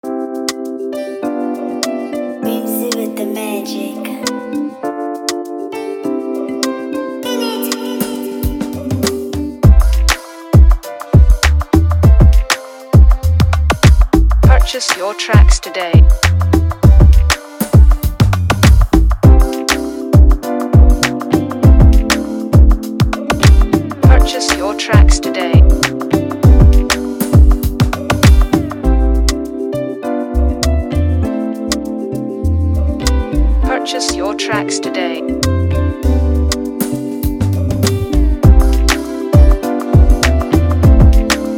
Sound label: Afrobeat Instrumental